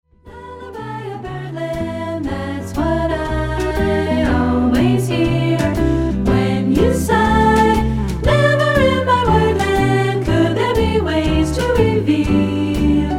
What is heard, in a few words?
with voice